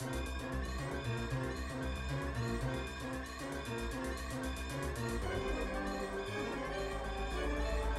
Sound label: escape sequence; metroid